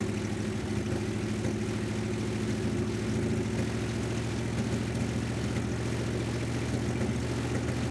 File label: engines; World of Tanks